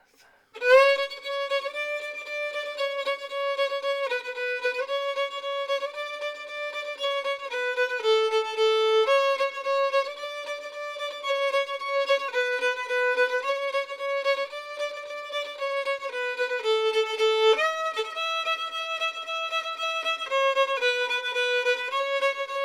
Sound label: fiddle; Bile them gabbage down